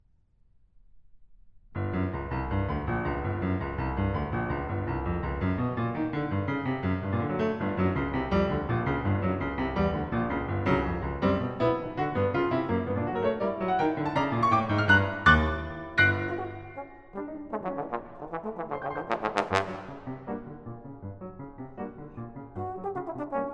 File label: Sonata for Trombone & Piano - Mvt. 3. With abandon- Wild